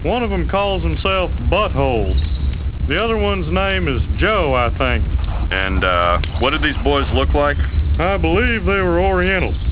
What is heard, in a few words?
police